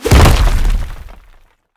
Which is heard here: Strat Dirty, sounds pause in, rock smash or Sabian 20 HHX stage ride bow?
rock smash